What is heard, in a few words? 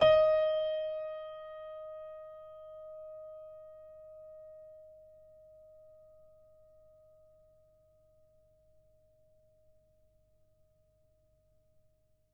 D sharp 3, piano